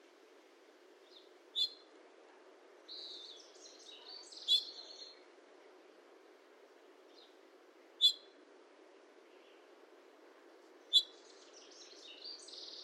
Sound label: Hooded Oriole